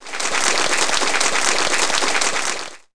Applaus; Multimedia & Business Audio Titles

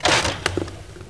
Sound Truhe auf

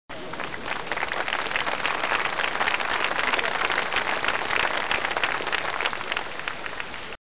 applaus; cannonhills

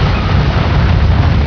Vulkan; PC Gamer IT CD 48; 1602 AD; 1602ad demo

